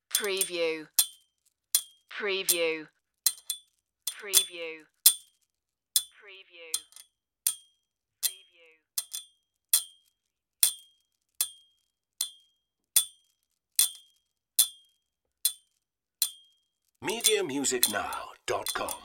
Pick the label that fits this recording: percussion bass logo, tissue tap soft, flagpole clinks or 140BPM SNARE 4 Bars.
flagpole clinks